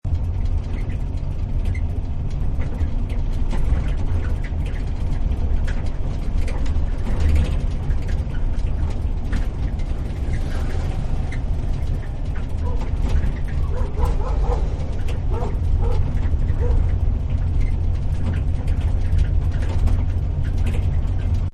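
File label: Tuk Tuk Bumpy Ride Interior; Carriage Sound Effects; Vehicles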